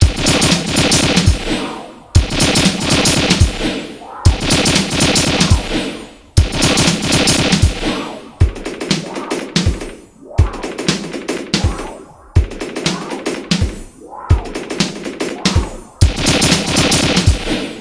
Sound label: AK 47 Tommy Gun Loop